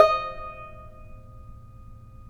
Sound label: tar; Roland - LA Composer vol. 3